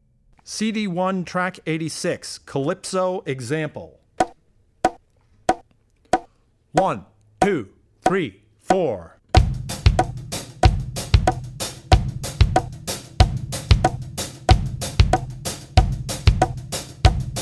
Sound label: Calypso; Drummer's Bible Tracks